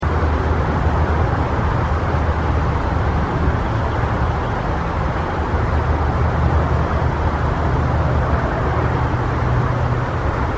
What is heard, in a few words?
Car Passing Loop